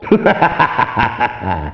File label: laugh satan